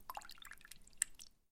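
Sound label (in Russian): вода поток близкий спокойный нежный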